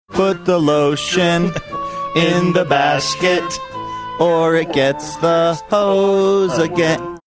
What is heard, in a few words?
singing put lotion in basket